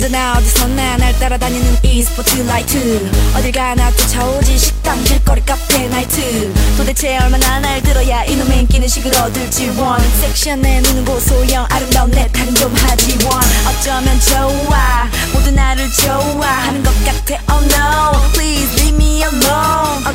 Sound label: Rap Remix